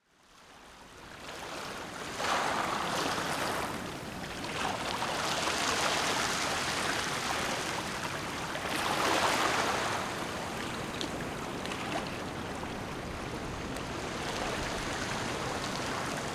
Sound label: ocean beach in oregon